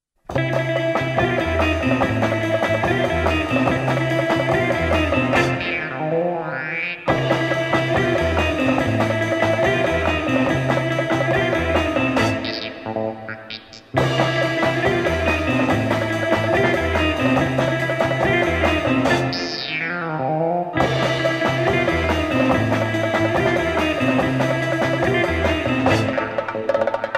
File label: arabic magic